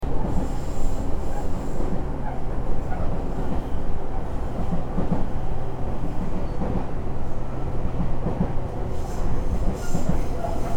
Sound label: on a train